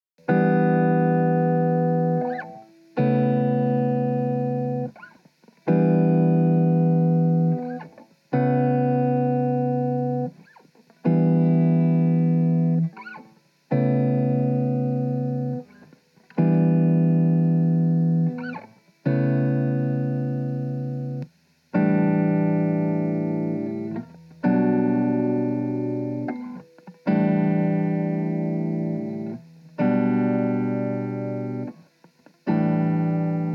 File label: Five Four Vinyl Blues